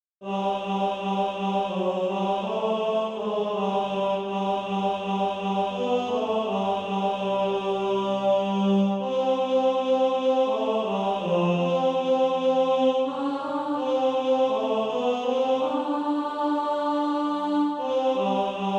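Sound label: tenor, SATB Practice Music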